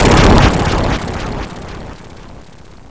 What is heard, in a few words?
Explode